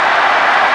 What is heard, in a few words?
crowd; FIFA98